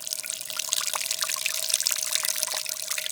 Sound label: Watering Can